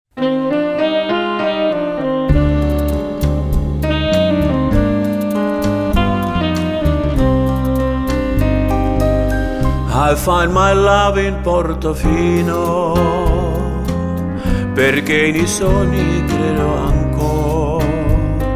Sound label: Latin Dance